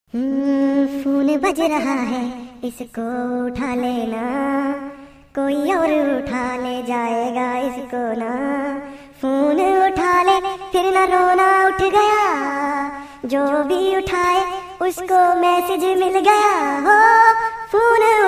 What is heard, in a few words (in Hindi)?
Rythem Hindi Comedy; Mein Hoon Na